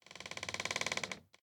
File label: wood creak; Devour